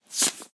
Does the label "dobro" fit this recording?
No